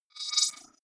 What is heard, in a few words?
wobble swell constant short; sweeps-swells